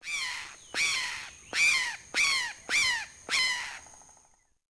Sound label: Jungle Bird; Ambient; Civ4 warlords